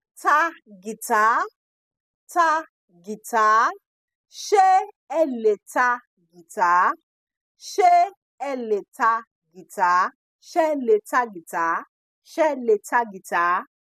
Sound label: play piano